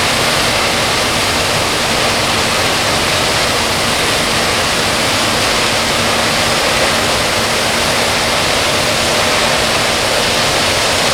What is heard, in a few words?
Aircrafts Engines APU AI9V noise; DCS